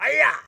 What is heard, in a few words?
Scream